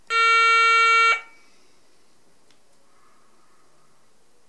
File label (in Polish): dzwonek